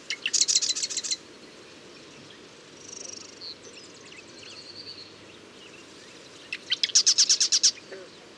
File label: Marsh Wren Cistothorus palustris